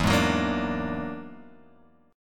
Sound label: E 9th Flat 5th E down Guitar Open G